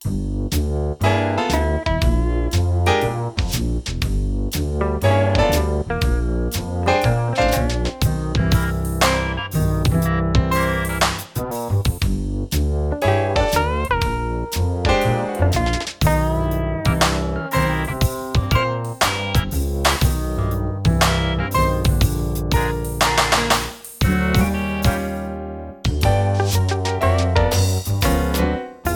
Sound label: fretless gt